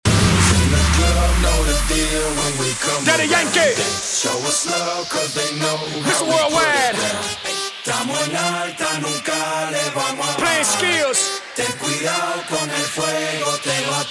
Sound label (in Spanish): guaracha remix 130 bpm